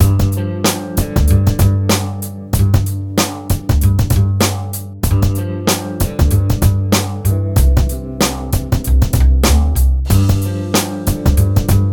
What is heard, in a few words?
Funky Skunk; hip jazzy cool